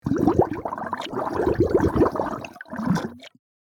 Deep underwater bubbles

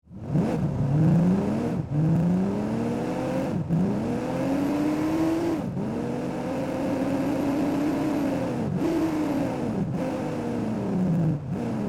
Sports car engine acceleration and run interior

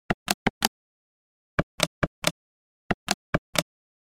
Sharp EL 8 old calculator press buttons; gfxsounds

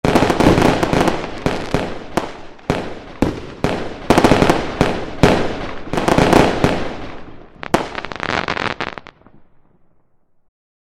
Fireworks display explosions medium

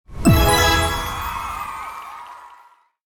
Win jingle success victory

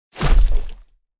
Robot assault unit footsteps single step

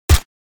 FN SCAR assault rifle suppressed shot

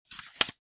Book turning page

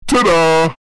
Heavy distorted ta daa; meme

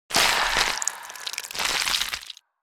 Vampire bite aggressive attack

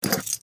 keys pick up from wooden table

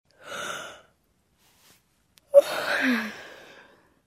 Teen girl yawning

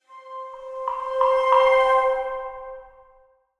Video game reward chest opening jungle

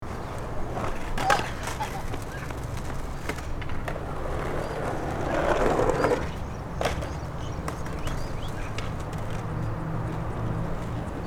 Suburban park ambience near to a skatepark

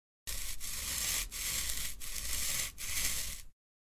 Snooker pool cue plaster

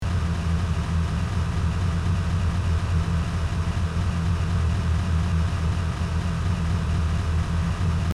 Sports car engine idling loopable

